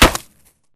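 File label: Bullet Light Ballistic Concrete impact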